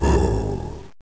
spawn zombie

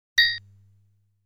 Countering a Bullet; Sound of Boss 6 Countering a Bullet

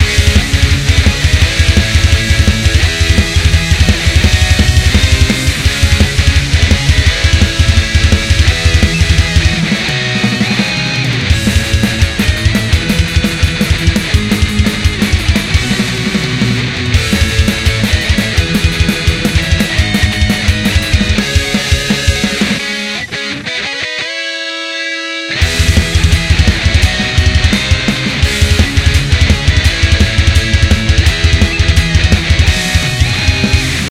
Loop; Idle Slayer; Windows

